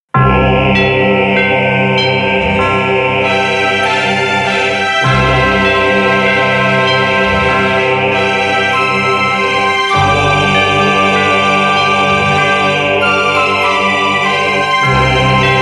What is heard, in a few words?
Evening Bells